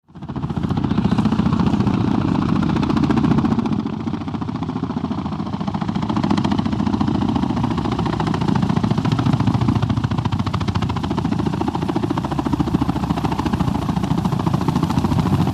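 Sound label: AH1 Approach Land Idle, AH-1 Cobra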